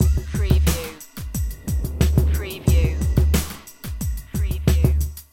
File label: Hip Hop Drum Loop 90 BPM - Straight